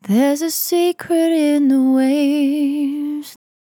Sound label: On A Star 65bpm F, Vocal; Zero-G